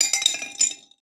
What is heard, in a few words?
falling, breaking falling hit